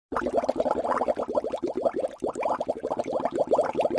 minion gelak, minion laughing

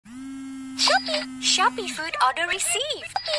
nada dering classic

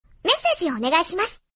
suara bayi jepang japanese

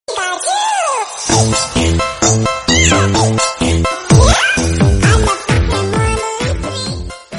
nada dering estetik

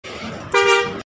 klakson hino

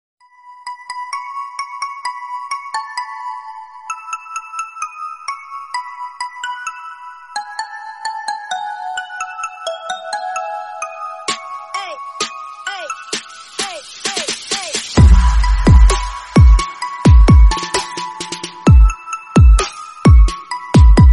es krim background music instrumental; campina

